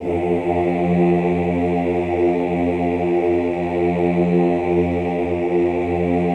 OOH F1, Classical Choir; Best Service; Extended Classical Choir

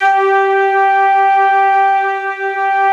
ST ORG, STRINGORGAN, Yamaha VP-1

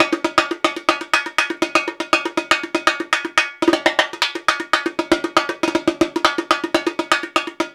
Rio Batucada, 120

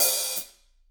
HH, Drums; Bob Clearmountain Drums I

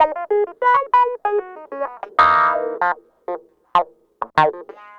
Clavinet, 70s Analog Funk

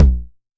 TOMS 909; Electronic; Wizoo - Platinum 24 Electronic

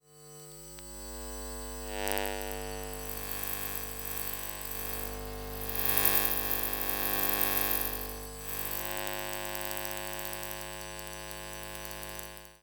Electromagnetic Fields

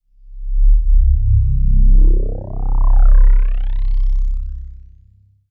Flange Sweep C, Ambient; Trance Formation

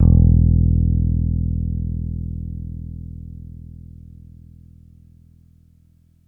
Big Synth Basses

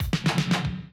Classic DrumLoops, 110

